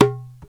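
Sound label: perc darabuka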